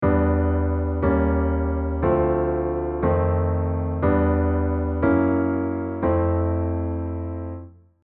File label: ura chord